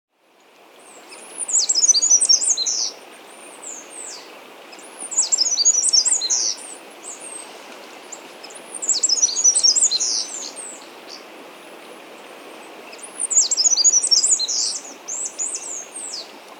Mountain Wren (Troglodytes Solstitialis), Andean Birds; S. America